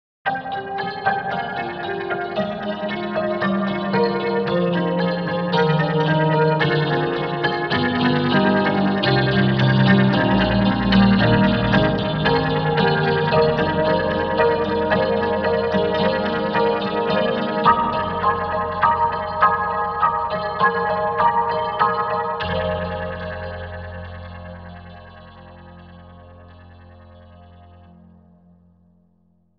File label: Tack Piano Desolate Tremolo; Spectrasonics; Keyscape; Wing